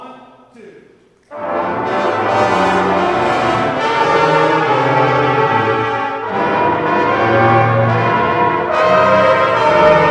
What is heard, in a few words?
Happy Birthday, brass; woburn ma